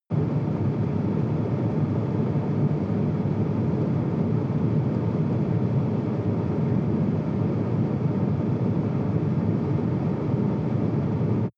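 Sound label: sports car interior ventilation step 9-15; verkehr transport; autos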